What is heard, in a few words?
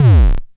Klone Dual-Percussion-Synthesiser, DRUMHITS; KLoNe MONO; 300 Drum Machines